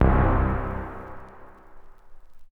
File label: Polysix Mo Verb Vinyl Synths C1, bass